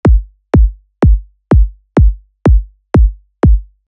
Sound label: Punch Emu; Tremor